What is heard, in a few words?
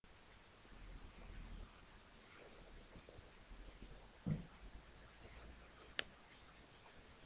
hammer